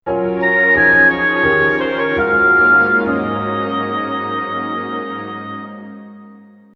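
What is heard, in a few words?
hautbois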